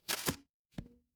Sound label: impact bow